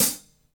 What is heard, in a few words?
Jazz Closed HiHat